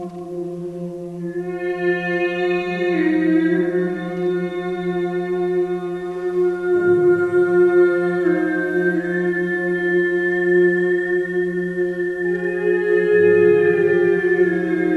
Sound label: kyrie, didgeridoo